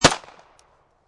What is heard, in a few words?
M9 9mm Bridge; VRSonic; Guns